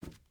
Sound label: wood jump